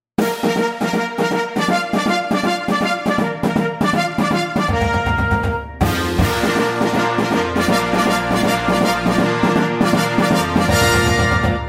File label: Athletic Support Charge 6/8